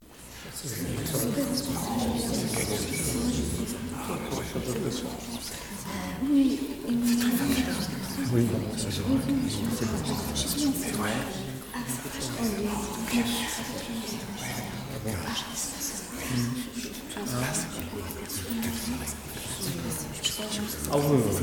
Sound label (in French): Atmos grande salle 30 pers murmurent; french; Crowds